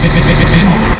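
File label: game over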